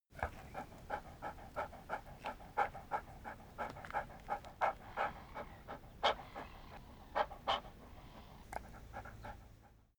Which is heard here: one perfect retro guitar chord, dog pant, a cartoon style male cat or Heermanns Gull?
dog pant